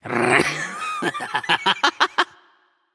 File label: laugh